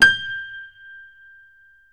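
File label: piano G1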